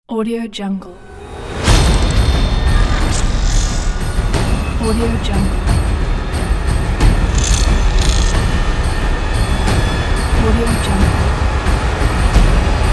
dark trailer logo

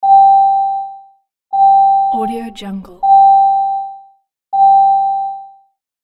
car seat belt ding

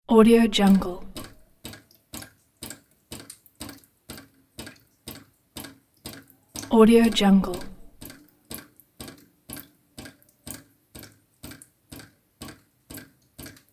water drops

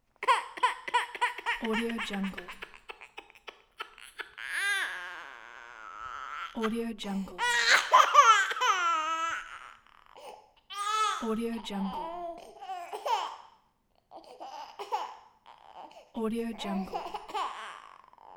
baby crying

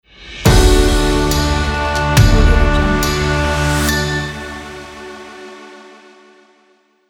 intro cinematic action space logo

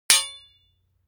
tool hammer hit metal ring bright; foley; smartsoundfx

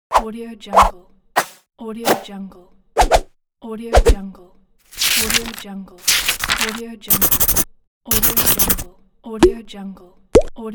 infographics pack

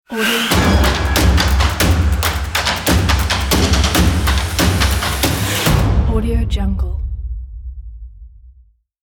epic drum logo

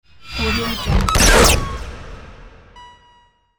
scifi weapon sfx

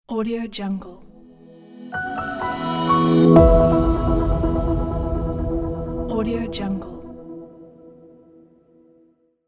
branding logo

